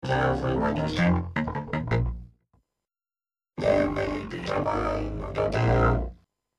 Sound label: Vocoder